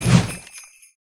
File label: Candy crowbar Swing; criminality